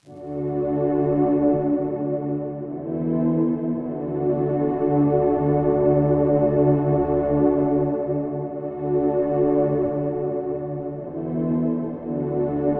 Ancient Egypt Music

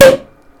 Champagne Drum

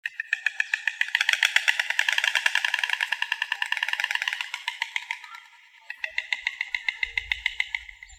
Storks Bill Clapping

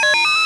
Beep Sequence

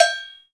Metal Cowbell Med

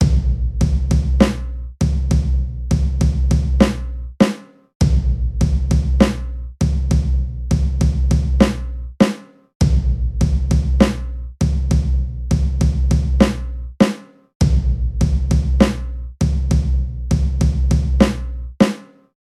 Drum sequence 100 Bpm

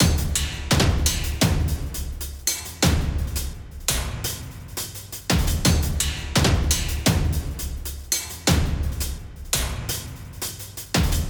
Action Percussion Ensemble Fast 170 Bpm

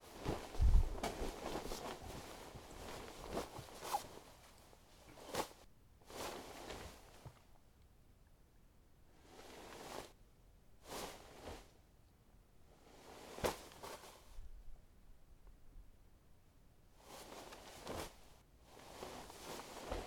Duvet Rustle Weightier Various

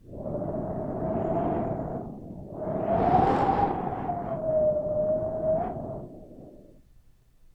Wind

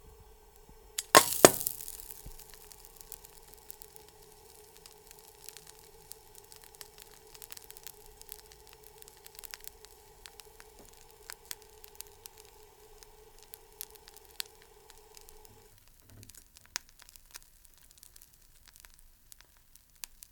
Egg In Frying Pan

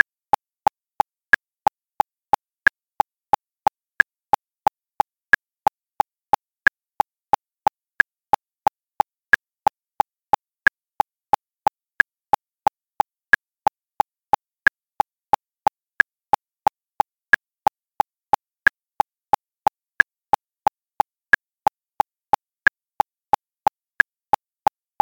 Metronome

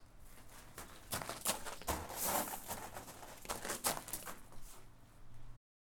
Running On Gravel

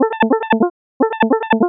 Ringtone

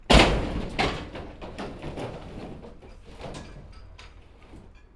Throw Garbage Garbage Truck

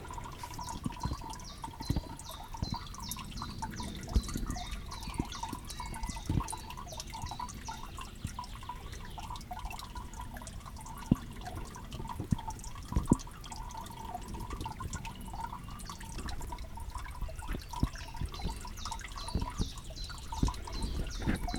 Water Dropping

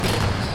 Dunk

